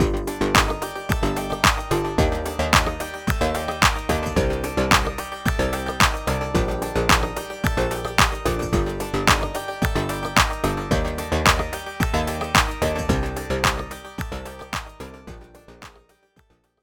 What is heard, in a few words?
timeshaper off